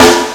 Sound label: S950 Live, Snares; Zero-G; Soundclash